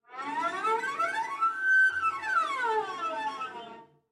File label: Cello Slide Up Down; SoundsCrate